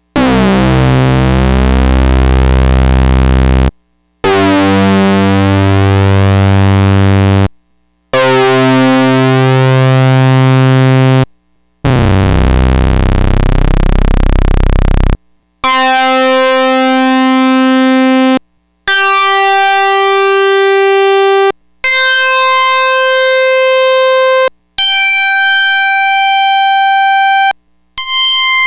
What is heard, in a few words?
P5 Saw Sync; Prophet 5; SawSync #3